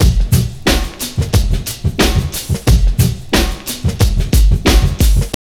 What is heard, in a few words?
TRIP 90, trip loop